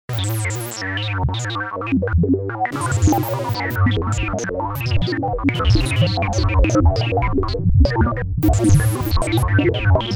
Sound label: sample and hold; reaktor; obx